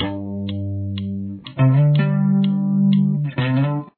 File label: detail, guitar